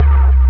Bass Hit; Mo' Phatt; Digital Sound Factory Mo' Phatt

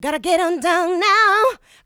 Female Vocals; Houseworx

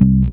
bass 4 (C); Sonic Foundry (Sony Creative Software); Ugly Remnants VOL-2; Basstuff

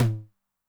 Noise High, 909 LOW TOM

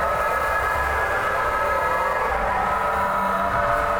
Tonal Re Draw, sparse soundscape

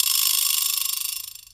Vibraslap

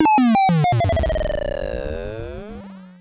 Klone Dual Percussion Synthesiser FX

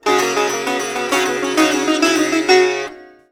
SITAR GRV, World Colours; Best Service

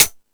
High Hat, Drum Machines

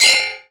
SOUND EFFECT, Metal; AKAI S6000 CD-ROM - Volume 1

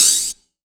A2, synth line; 90 s Sample CDs; NiceBeats; SynthLine VOL-3

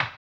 HAMBONE; Blues & Soul

